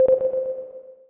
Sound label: Popup appeared; Business skieme Beta 1